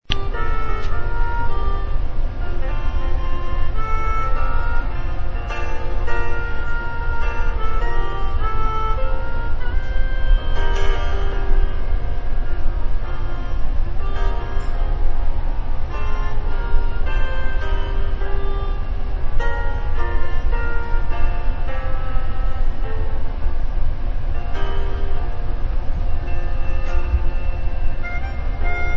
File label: chinese smooth jazz